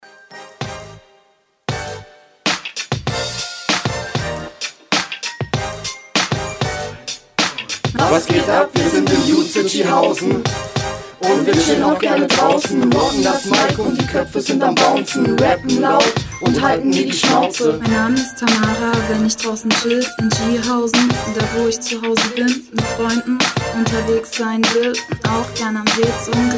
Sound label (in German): rap workshop